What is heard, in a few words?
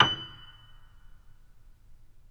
PP F#6, Grand Piano; AKAI S6000 CD-ROM - Volume 2; Grand Piano 256M